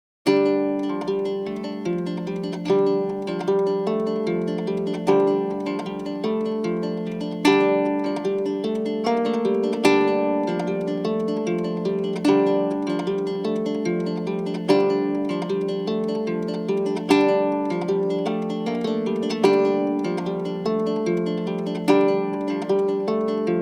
Tempo 75.0 bard kitara; assassin s creed valhalla

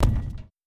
Step Heavy

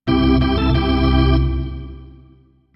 Baseball - Single; Wii Sports Club

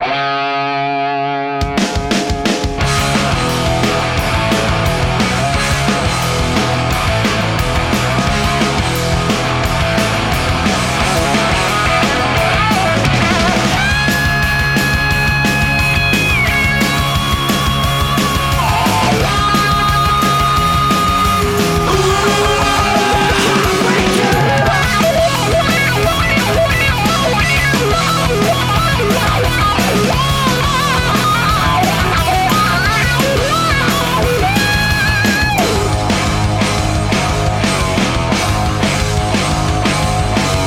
(Instrumental); Rugby League Live; PS3; Xbox 360

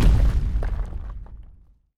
Destroy Boulder

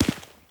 Step Stone

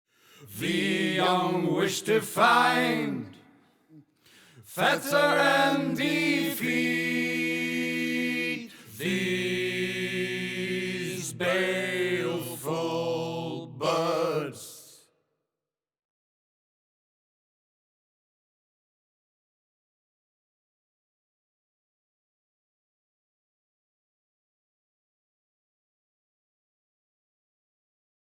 Tempo 100.0 eng chorus; assassin s creed valhalla

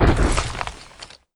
Bodypush

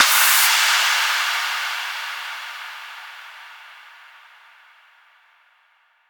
Fx Impact Cave; bass house; fx vocals

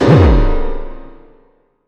Sting

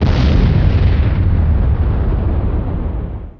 SFX CANNON; Jeff Wayne's The War of the Worlds

